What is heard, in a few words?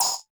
Drums; Distored Reality